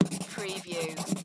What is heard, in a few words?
Pencil Write On Card